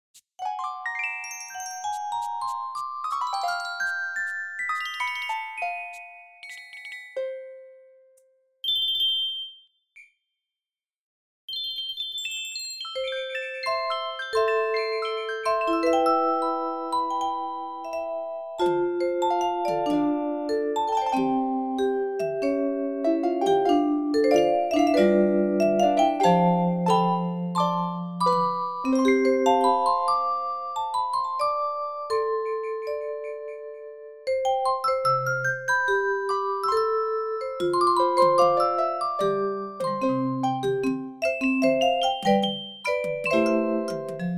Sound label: chimes angel medley